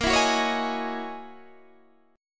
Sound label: F sharp 13th A sharp